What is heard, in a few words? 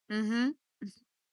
mhmmm